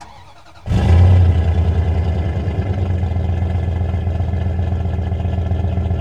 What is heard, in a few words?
Truck Durango Start Loop; Trucks